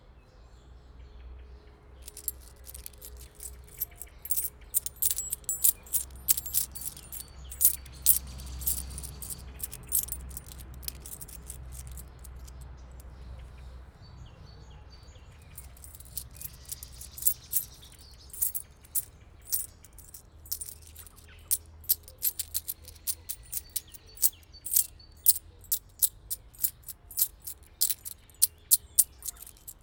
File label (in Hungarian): a megvesztegethetetlen madár, szajkó; kecskemeti zoo 2016